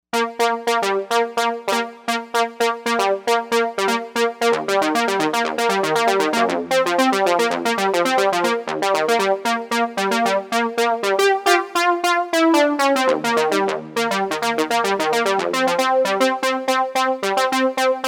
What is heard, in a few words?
PWM Lead, Novation UltraNova; Factory Patches